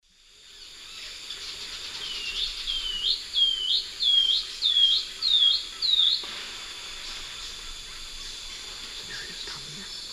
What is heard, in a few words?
Myrmotherula longipennis zimmeri, song; S. America; Eastern Lowlands